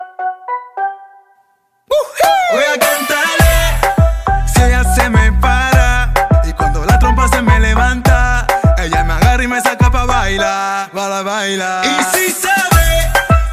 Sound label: Spanish Ringtone